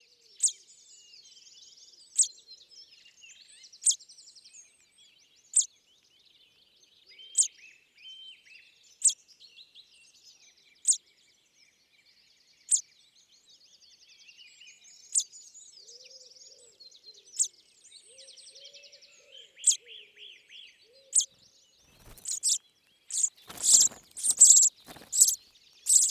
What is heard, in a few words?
Chaffinch